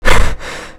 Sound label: gasp; gesource; player